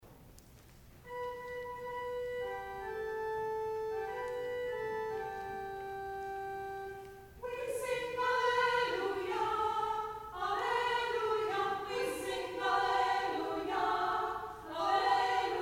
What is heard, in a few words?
Chancel Choir